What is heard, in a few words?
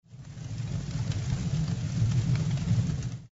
crackling fire